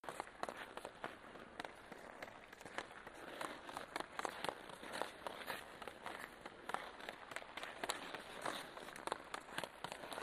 Hockey Player Skate

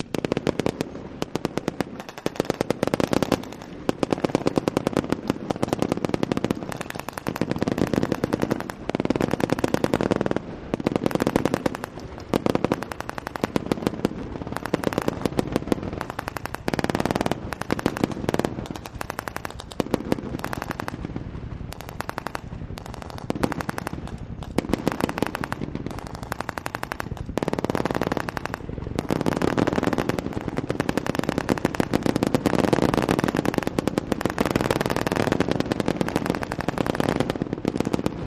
urban assault, simulations

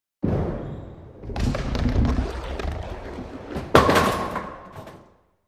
Bowling Ball Rolls

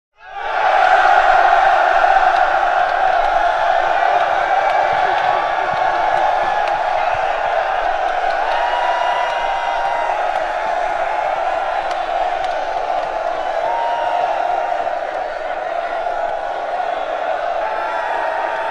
Infantry Roars, infantry cheers; Infantry Roars 6; 1000 Men Roar, Ooh! Rhthmically, Scream And Bang Weapons, Aggressive, Wide Perspective

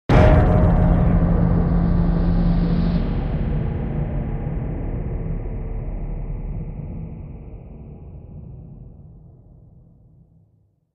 Impact Deep Metallic

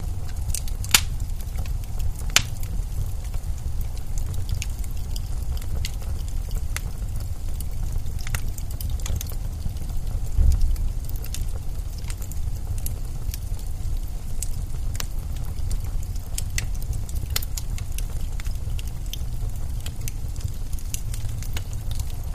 Fireplace Crackle

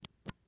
Buttons

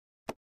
Cards Deck Taps, card games; casino games

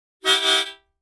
Mouth Organ Shrt, harmonica; woodwinds

